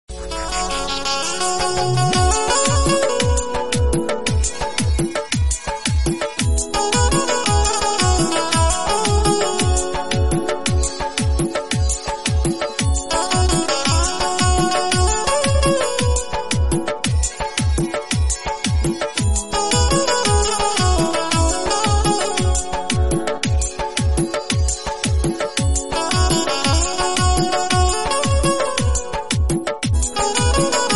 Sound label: guitar bgm